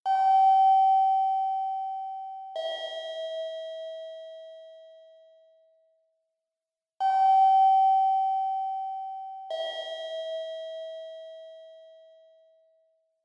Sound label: Ticket Gate Signal, Loop